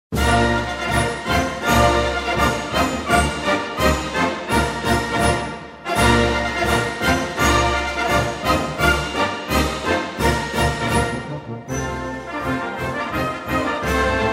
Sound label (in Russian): Marsh; Marshi Rossijskoj Imperii; Marsh Lejb-Gvardii Preobrazhenskogo polka